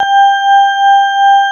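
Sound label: CX3 organ G1 L, Keyboards Old School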